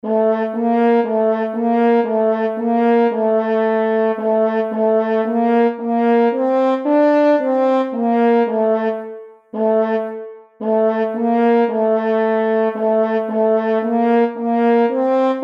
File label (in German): Czardas Horn